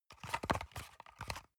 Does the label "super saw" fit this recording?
No